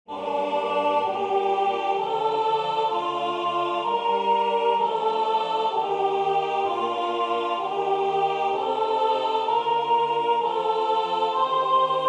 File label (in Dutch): heer mijn hert engels; Heer mijn hert is boos en schuldig